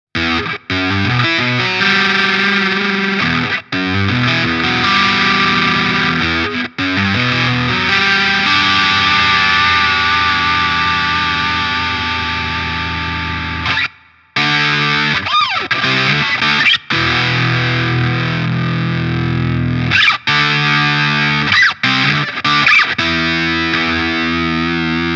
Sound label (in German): ehx Hell Melter NORM Mode Gain 12 h. Boost on EQ leichter Mid Scoop